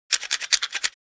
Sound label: Matches box handling shaking